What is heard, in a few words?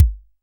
Kick, Clavia Micro Modular